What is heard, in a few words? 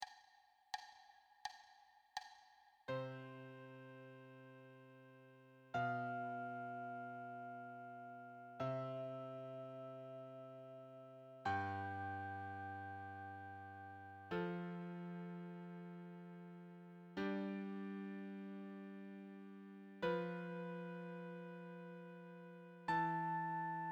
wholenotes duet